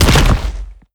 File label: step detail, strider; creatures; Half Life Alyx